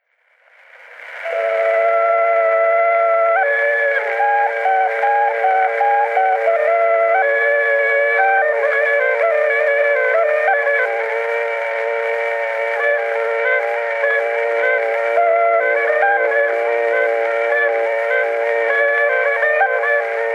Torupilli Jussi lugu, Emmaste, torupill, folk

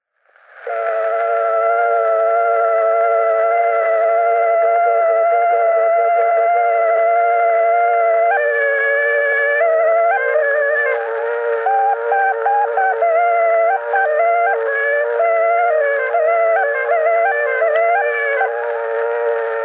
Noorepaari lugu Emmaste torupill folk; Torupilli Jussi 10. lugu; Juhan Maaker

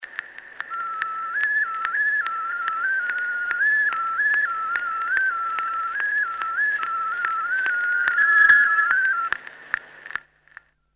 Pillilugu, vilepill folk; Kuusalu; Mart Masurin

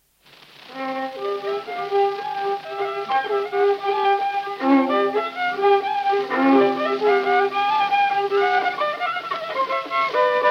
Ringvalts Paistu viiul folk

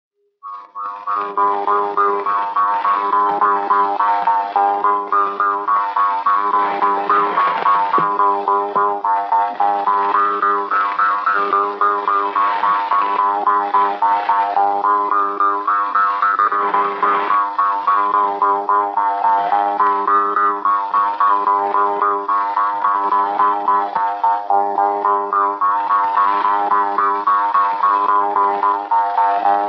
Labajalg, parmupill, folk; Joelahtme; Siu-sau-sau; Peeter Piilpark

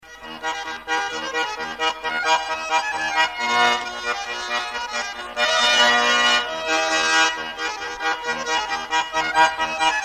lõõts; Polva; folk; Minu armas Klaara

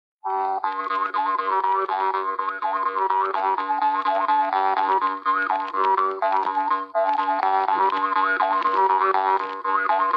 labajalg parmupill folk; Laane-Nigula; Rui Kaarli labajalg

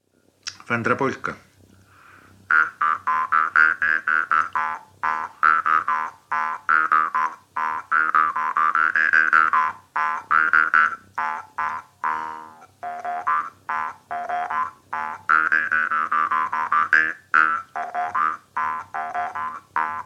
polka Viru-Nigula parmupill folk; Vändra polka

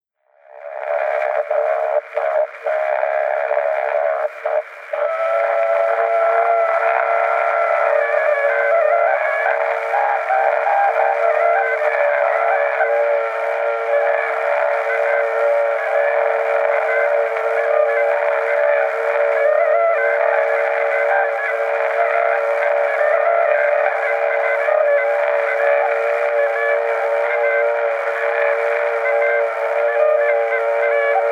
Torupilli Jussi lugu, Emmaste, torupill, folk

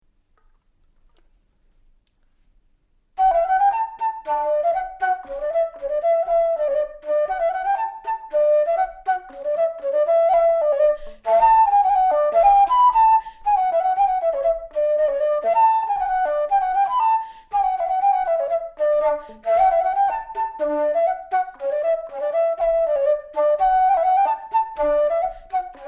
Aadu polka flööt

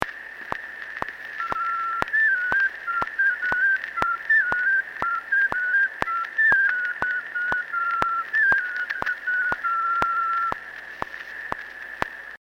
Pill lõppeb vilepill; Kuusalu; folk; Mart Masurin

